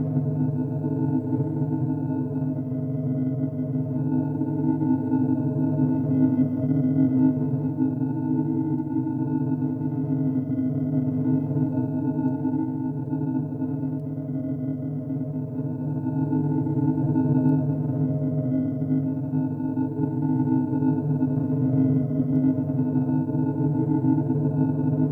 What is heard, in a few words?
Operator Room; Warframe; Liset; Hub; Lower Deck